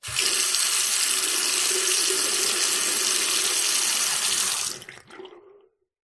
household tap faucet basin bathroom turn on run medium drain no plug; zapsplat